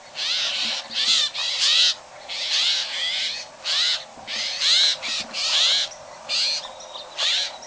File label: Scrub Jay; bird